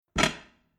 Household Domestic Kitchen Cooker Arga Hot Plate Lid Down Single